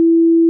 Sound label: beep